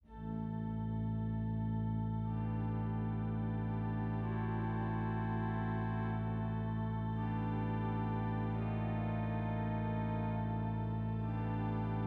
Improvisation, organ; organteq2

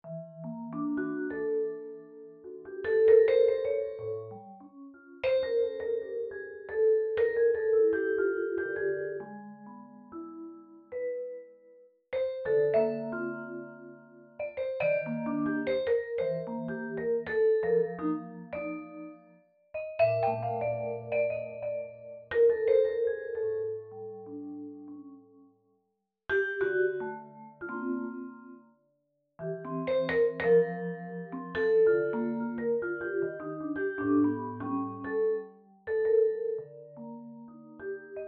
xylo; pianoteq7

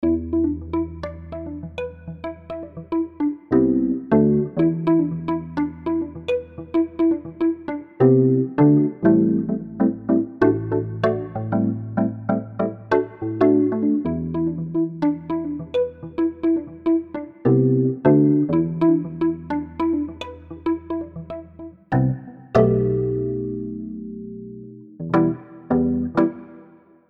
Electra; pianoteq8; hohner

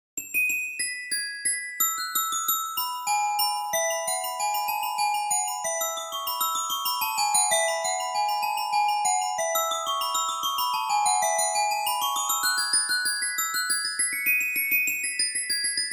Glockenspiel; Preludio; pianoteq8

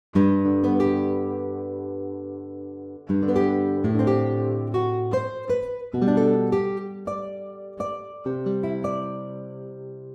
guitar; A. B. Mangoré - Sueño en la floresta; Pianoteq

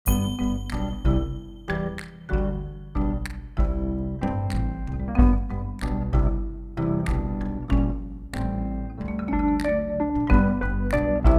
Wurly; electric; pianoteq8

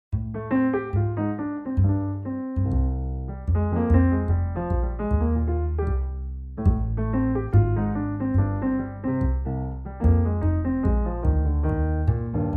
Blues etude; pianoteq7; modeld

